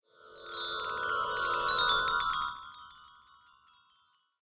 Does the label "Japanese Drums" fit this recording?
No